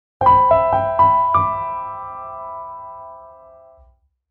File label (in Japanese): ジングル